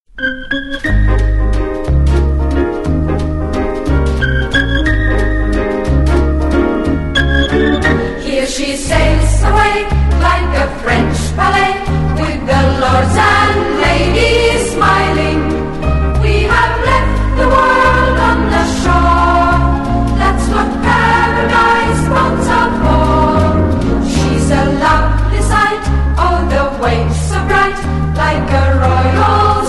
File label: Secondary School Chorus Waltz; The Tapiola Secondary School Chorus